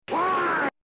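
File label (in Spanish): grito, scream; final fight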